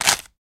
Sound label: Bone Crab Shell Break